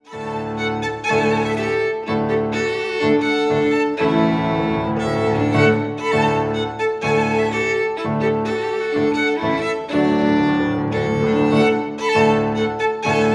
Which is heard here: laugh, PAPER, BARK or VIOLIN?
VIOLIN